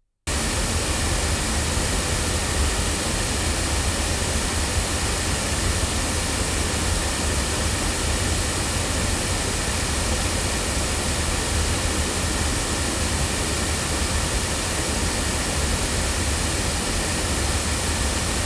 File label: Golden Age TC1 cardioid loudspeakers